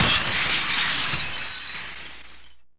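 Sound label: glass break; Sierra On-Line; SWAT2DEMO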